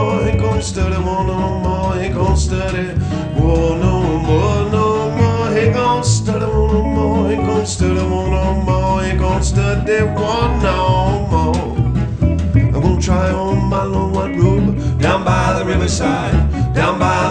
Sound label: blues